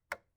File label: light switch wall plastic flip on off